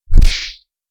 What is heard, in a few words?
Strong Punch; Mike Koenig